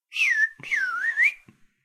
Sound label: Whistler bird